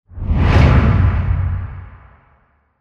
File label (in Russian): perehod kadra; Короткие звуки для монтажа — для transition, движения, смены кадра, звуки для выхода эффек